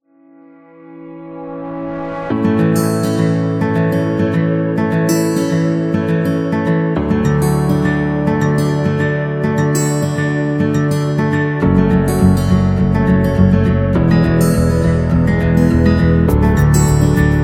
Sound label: corporate acoustic guitar; corporate music vol 10